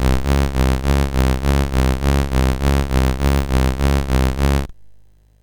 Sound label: sid MOS6581R2; California Games